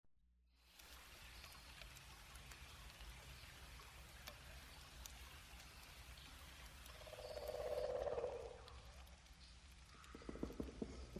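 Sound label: ambience SWAMP RAINFOREST BIRDS MAMMALS; wildlife sounds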